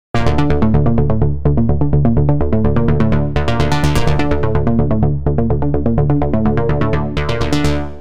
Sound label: BASS Selected